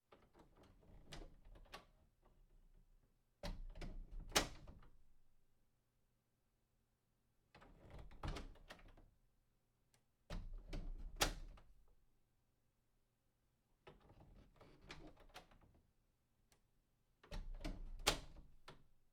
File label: wood door open close soft